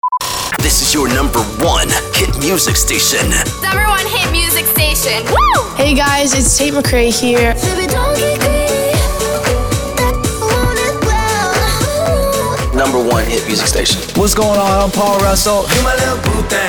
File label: sweeper beatmix